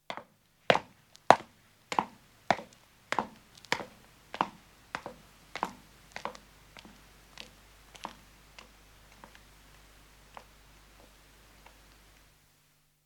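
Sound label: Footstep stone descending, 1 man departs; Footsteps (Sound Effects:Human); BBC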